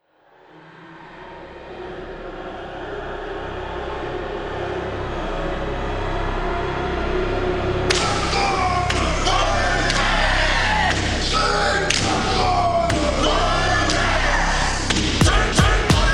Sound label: hakatek rythm and samples only, haka